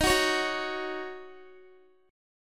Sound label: D sharp Minor 7th, Guitar; strummed